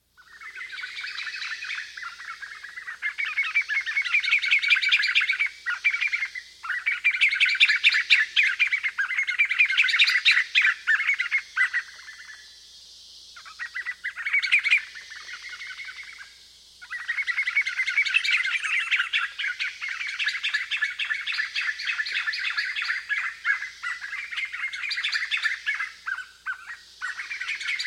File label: White-fronted Nunbird; S. America